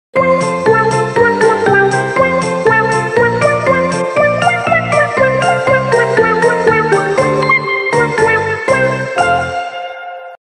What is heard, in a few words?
intro music; pingo; pingu